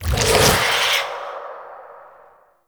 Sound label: Alt Shot Whispers; weapons; Noctua